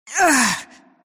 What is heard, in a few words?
pain; drowranger